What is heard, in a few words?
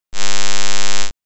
puls 100, standard waveforms; praat; understanding waveforms